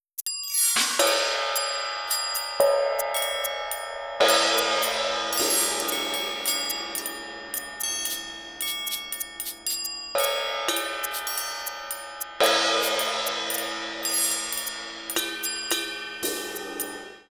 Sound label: perc set 110bpm